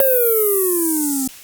FX, Dual Percussion Synthesiser; Klone

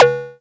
percussion; FutureRetro FR777

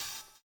HHO, Dance Mega Drums; Best Service

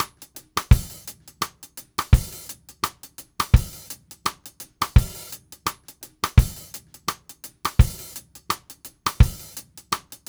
Reggae, Drumset; Sonic Foundry (Sony Creative Software); Joe Vitale Latin Percussion

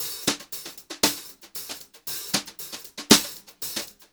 HOTROD VARI, Brushed Drum Loops

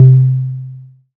SERGE Tom Low, drums; Polyend; Stazma & Concrete Collage; Concrete Collage Drum Kit; Concrete-Serge Drums

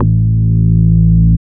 BASS; X-Static Goldmine 1

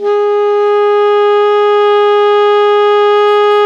ALTO PP G#3, saxophones; Greytsounds - Philip Wolfe David Crozier's Saxophones; ALTO SWITCH

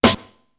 deep, snares; Zero-G - Funky Elements

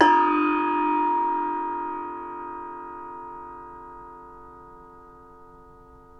NEPAL CYM, Asian Cymbals

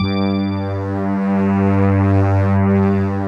FM SYNTH; OMI - Universe of Sounds

